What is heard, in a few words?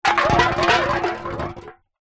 food drink soda can empty roll wood deck